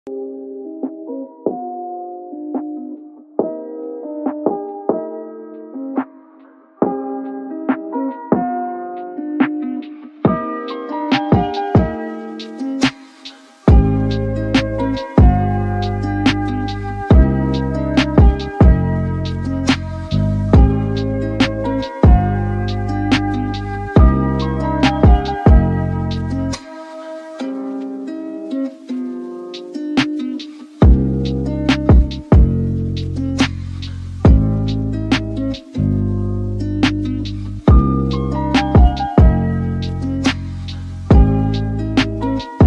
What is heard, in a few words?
Lofi